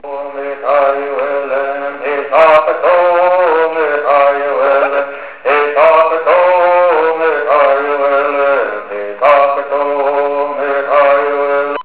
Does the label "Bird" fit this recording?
Yes